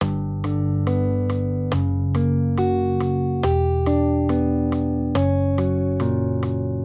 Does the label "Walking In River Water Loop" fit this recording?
No